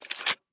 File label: gun cocking slide; soundscrate